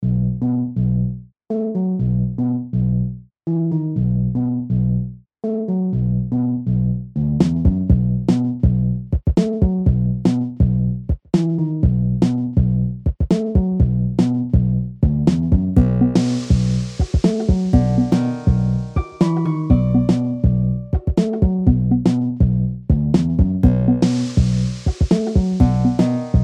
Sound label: lofi spa